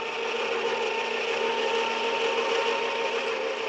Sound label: Golfcart Loop